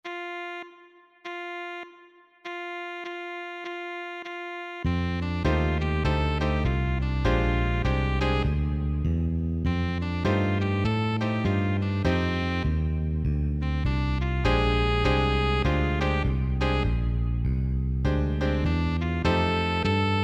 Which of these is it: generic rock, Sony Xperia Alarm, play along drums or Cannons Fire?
play along drums